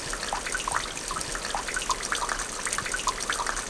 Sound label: stream; moria